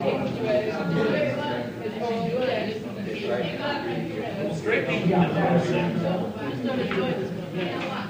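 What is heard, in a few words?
Classroom Walla Short; School - Classroom / Books & People Moving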